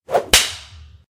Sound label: whip